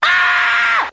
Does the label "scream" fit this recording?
Yes